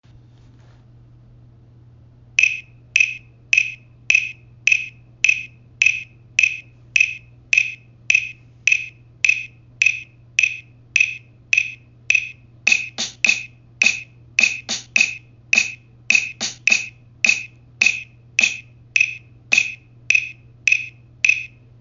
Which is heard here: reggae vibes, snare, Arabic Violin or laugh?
snare